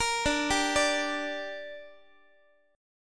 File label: D Suspended 4th Sharp 5th down Guitar 4A,4E,5A,5D,5G sharp,6C sharp; arpeggiated